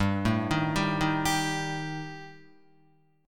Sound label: G Suspended 2nd Sharp 5th, Guitar; arpeggiated; 2,0,3,0,3,3; 4F,4A,5C,5G,5C,6E